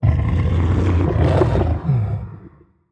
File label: attack; Barotrauma; Charybdisold